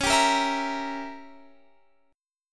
C sharp Diminished 7th C sharp Guitar Standard; strummed; chord; JGuitar

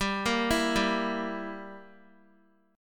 G Minor 6th, Guitar; Gm6 arpeggiated; x,10,10,9,7,x

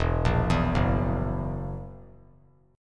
E Suspended 2nd Flat 5th E down; arpeggiated; JGuitar

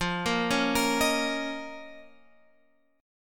F 7th Suspended 4th Sharp 5th F; F7sus4#5 Chord {13 13 11 X 11 11} arpeggiated

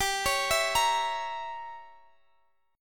Bb Diminished 7th G

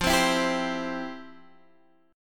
F Major 7th Suspended 2nd, Guitar, Half Step Down; FM7sus2

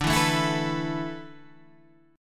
D 6th Suspended 2nd D, chord

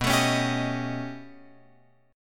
B Augmented 9th B down Guitar Open F; strummed; JGuitar

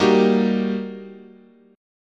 G Minor Major 7th Sharp 5th, guitar chord

